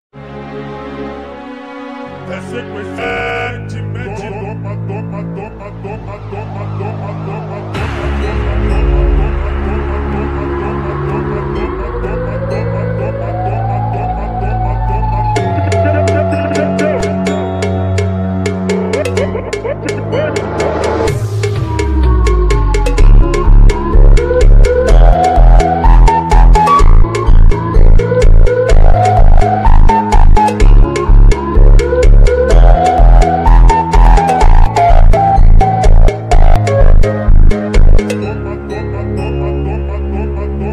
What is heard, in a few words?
funk extended